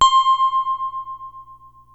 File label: PPG C5, Synthesizer; Hollywood Edge; Giorgio Moroder Rare Synthesizer Collection; PPG.2.3